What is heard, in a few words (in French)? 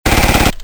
Mitrailette; bruitage; Armes